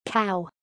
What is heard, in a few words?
cow